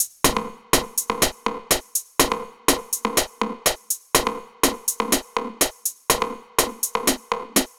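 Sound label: Percussion, 123bpm, Nu Dance Electro; Combi Loops; Zero-G; Soundclash; Acidized Wavs; The Arches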